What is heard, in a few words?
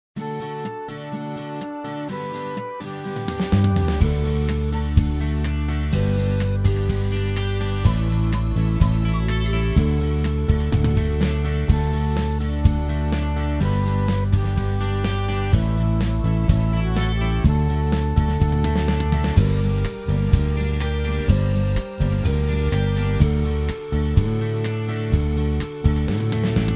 Alleluia repeated backing; Backing tracks